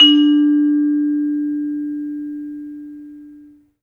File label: vibes; 3D Sound; 3D Keys VOL-1